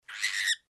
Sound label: cockatiel